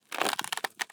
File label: bow pull; civ13; civ14; Items